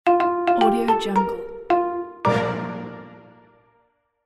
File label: cartoon finished